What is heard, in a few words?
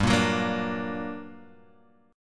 G Minor Major 7th, down, Guitar, Standard; GmM7 strummed; JGuitar